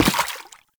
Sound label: Butcher Step Water, Footsteps; Enemies; Foley; Warframe; Lasrian Soldiers